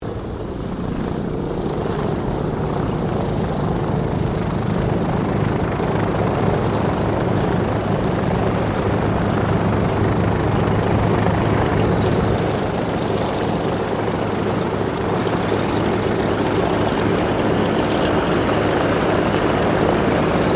AIRCRAFT DOUGLAS DC3 TAXI; Qantas 1942 DC3; Airplanes (Sound Effects:Vehicles)

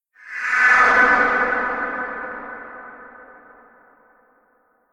Whoosh Transition SciFi Airy Dramatic Slash; Sound Design

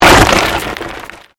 Convolution Pulse

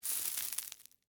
Debris Ice Cream Cone, Soft; Texture; BlastWave

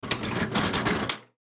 Ice Tray Out, Ice Tray Pull From Freezer; Food; Blastwave

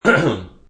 Man Clears Throat, Man quickly clears his throat; Clearing Throat Sound Effects; Sound Effects:Human

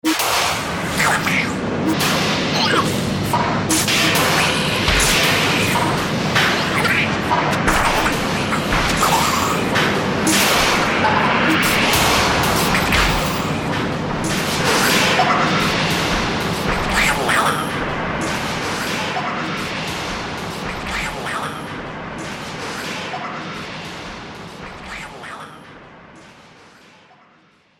Goblin Attack Atmosphere, monster sound effects

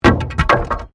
Wood On Wood; Material components for constructing more complex sounds; Wood (Sound Effects:Materials); SoundEffects-Foundation4

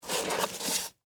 Rope Pick Up, From, Wood, Surface, Heavy, Rope

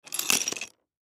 Hatchet Pick Up, Hatchet Pick Up Scrape Rocks Axe; Axes; Industry; Blastwave

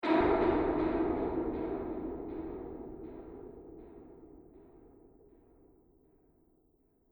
Distant Impact; Distant Ko Impact; Miscellaneous Impact Sound Effects; Andrew Fleming

